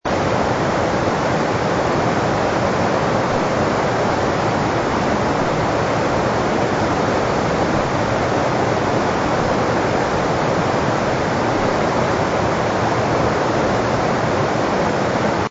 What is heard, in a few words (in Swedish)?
vattenbrus; dag 1